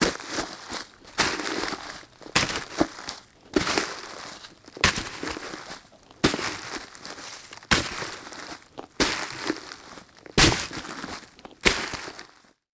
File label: Foley Footstep Soft Sole Dress Shoes Leaves And Twigs Debris Walking